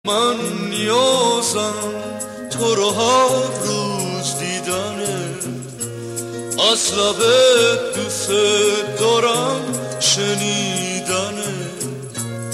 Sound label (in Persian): کوروس Ringtone; اجزای یک ترانه